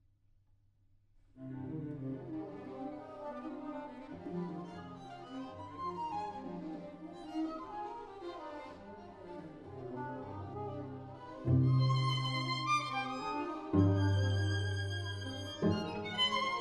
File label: String Quartet; Edward Cowie; 'Birdsong Bagatelles'; Mute Swan